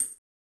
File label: RSS PERC